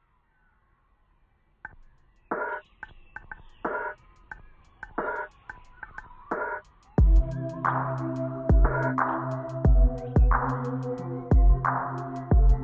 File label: BEAT UPLIFT